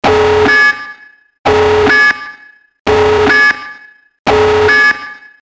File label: Nuke alarm